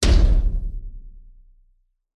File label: impact metal large thump thud rattle reverb